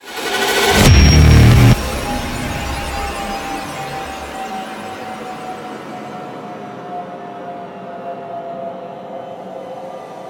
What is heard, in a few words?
ending; Doom; VEGA Core